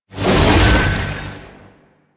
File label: Explosion Cast; Warframe; Warframes; Khora